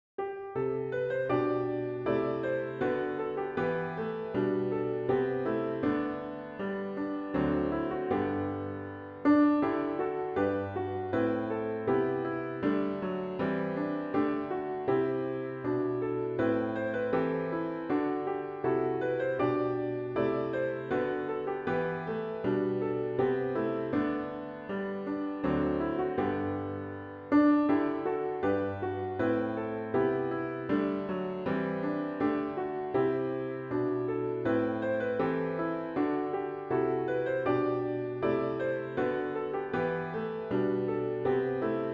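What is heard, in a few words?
Hymn piano with Intro; worship service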